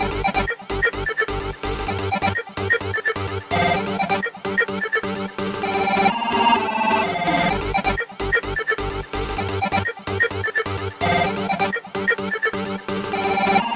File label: Intense Music